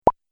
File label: Idea Single Pop, cartoon